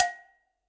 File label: Cowbell Mid Classic Muffle; Drums; Drum Hits; Standard Hits; Ableton; Factory Packs; Session Drums Studio